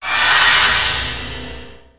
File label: anger